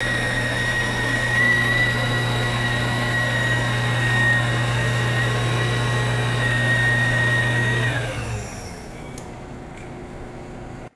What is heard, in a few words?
industrial machine hum with wavering whistle; ambience